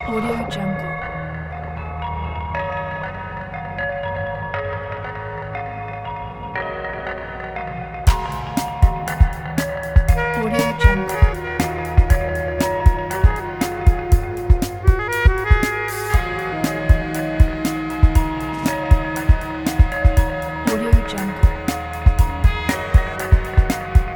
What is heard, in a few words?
harmony inspiring